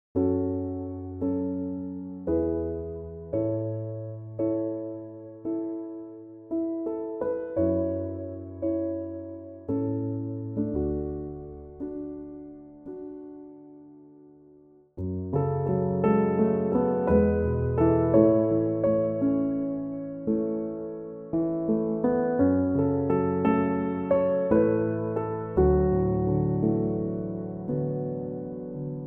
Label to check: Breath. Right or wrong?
Wrong